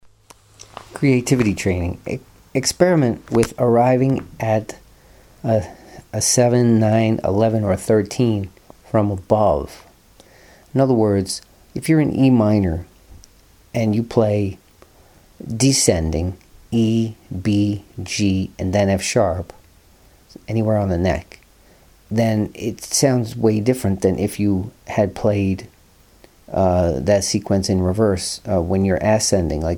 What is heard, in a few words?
arrive at non chord tones from above